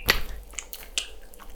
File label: piston, barnacle